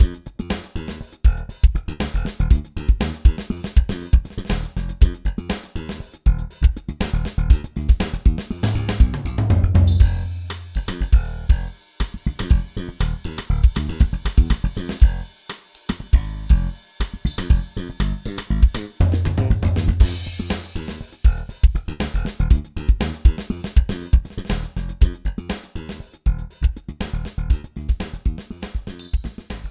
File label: SR18 Funk